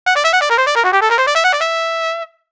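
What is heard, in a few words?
trumpet solo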